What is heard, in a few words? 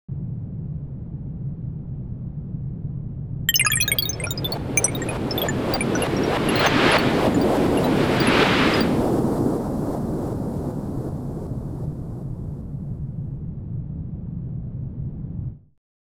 intro SFX